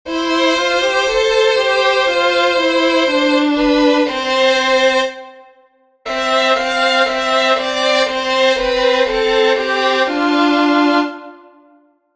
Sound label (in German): seitenbewegung; dommer 1865